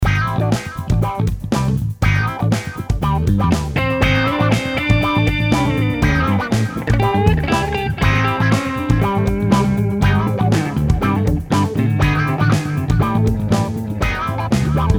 Electric Guitar; Zoom R4 MultiTrak Demo

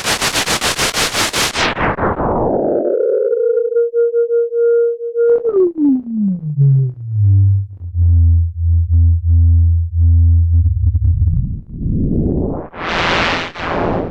spectraphon noise; Make Noise

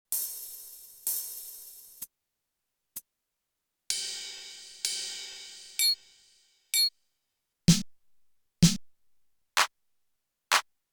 OH Sounds

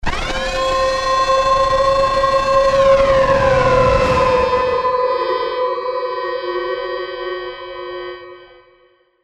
Stings Hybrid Atonal High; Gravity 2

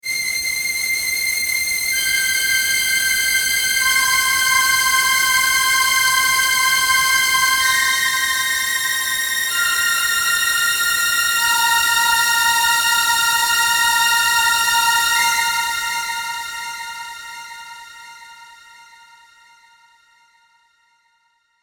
strings old Osc

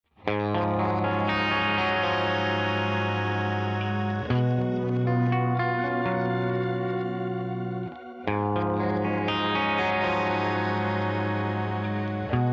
Riff Crystallizer

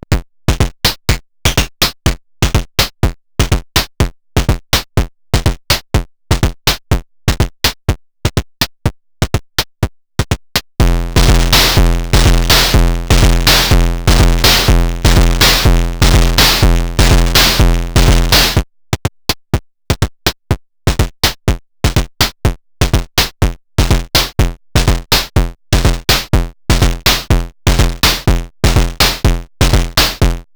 Groove; Body Synths Metal Fetishist

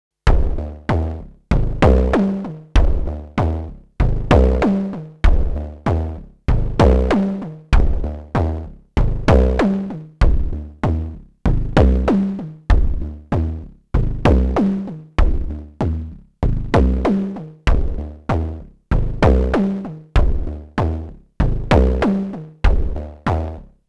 Behringer Edge

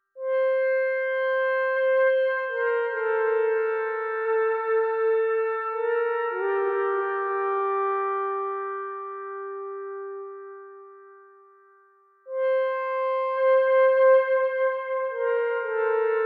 Headrush Synth Flute Synth

